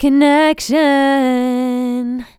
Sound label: "Connection" - B maj, Vocals, One-Words; GhostHack